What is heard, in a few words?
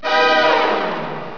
burst; NISSAN